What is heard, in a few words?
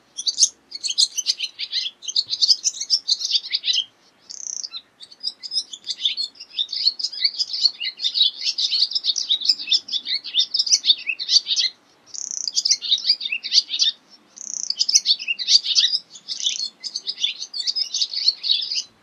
swallows; animals